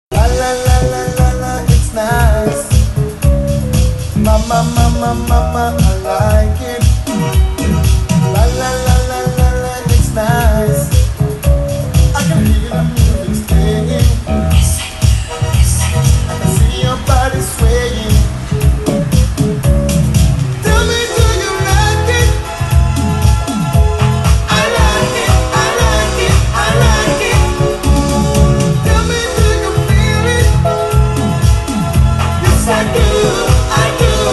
soul funk afrique du sud

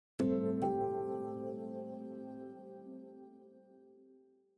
Music; Genially

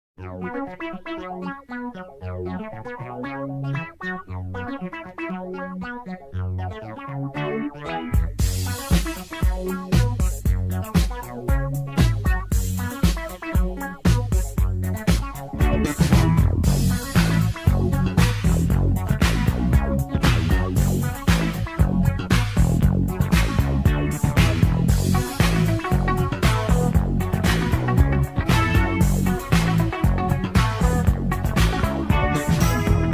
Funk

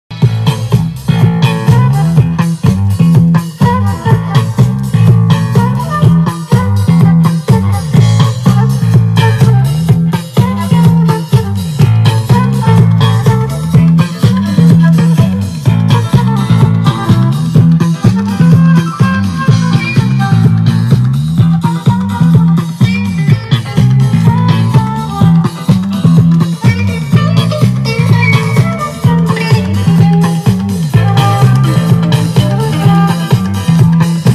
Funk

